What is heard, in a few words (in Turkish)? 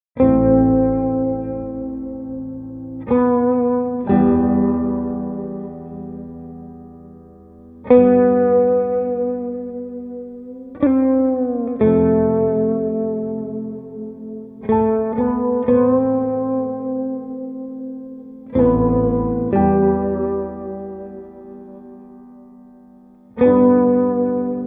Gitar Versiyon; Kuzgun; Kuzgun Dizi Muzikleri